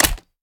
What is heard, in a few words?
reload magin, weapons; gmod; viper